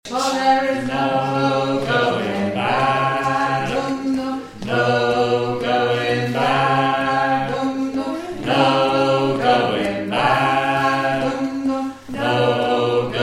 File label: bass